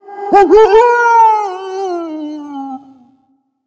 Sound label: Undead Beast Death; Arfus